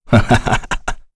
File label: Vox Happy; kingsraid; voices heroes en